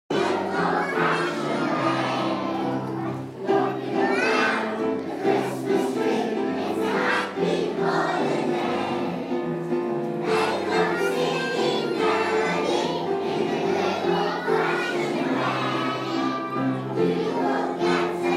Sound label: parents and carers singing along